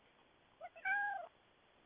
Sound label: cat meow